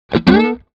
Electric guitar slide

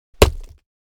Hard soil rock pieces thrown on rock bricks

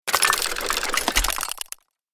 Small gears mechanism movement

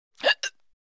Woman short hiccuping

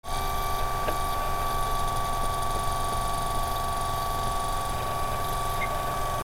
CD or DVD spinning motor whir loop

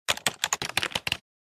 Mechanical keyboard typing

